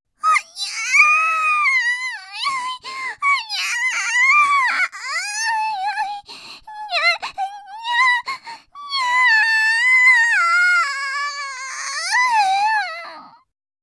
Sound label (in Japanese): あえぎ